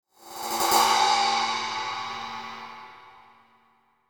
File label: ride